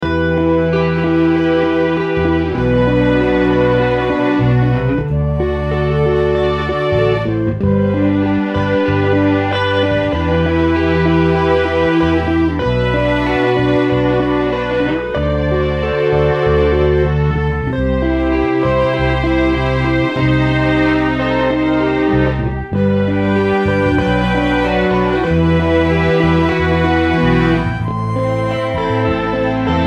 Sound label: Flute